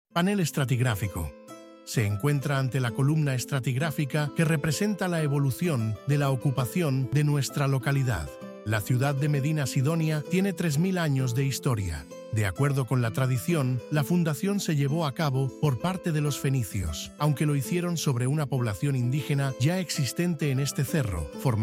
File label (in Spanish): audioguia música